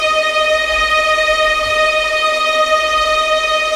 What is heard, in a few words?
tremolo; Level4 Hidden World